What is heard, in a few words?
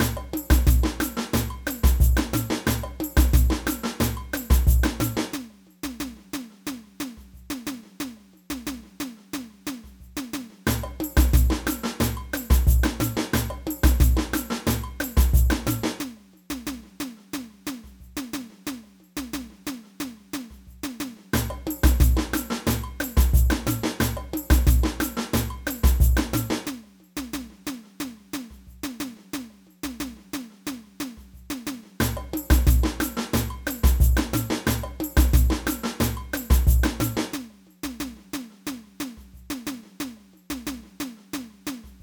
tamborim, samba